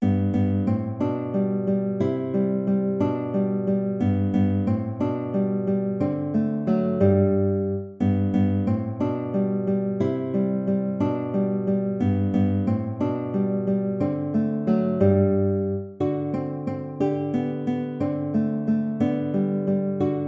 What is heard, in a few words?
waltz; Carulli Ferdinando